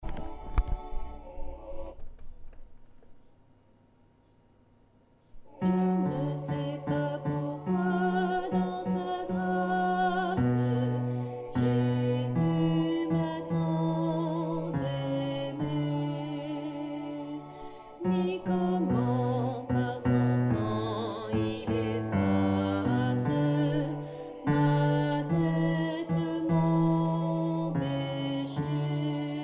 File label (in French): Je ne sais basse